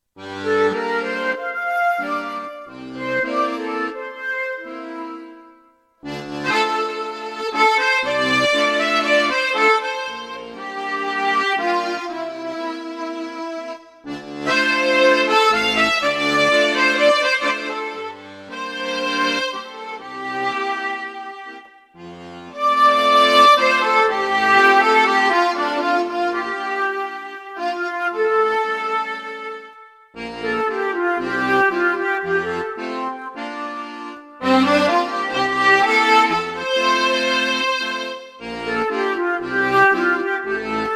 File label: melody; ningbo